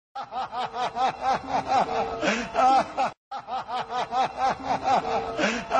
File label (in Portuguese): risada hahahha